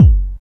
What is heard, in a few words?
bassdrum; Ueberschall; Jam Box